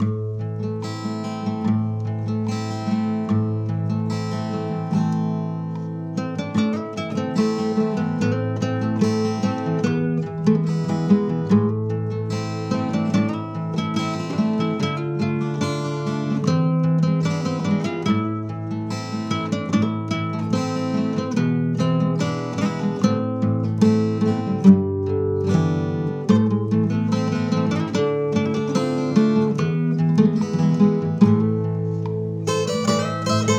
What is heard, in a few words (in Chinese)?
吉他曲